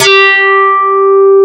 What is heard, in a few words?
QUITAR